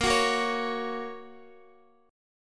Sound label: A sharp Minor Sharp 5th A sharp down Guitar; strummed; JGuitar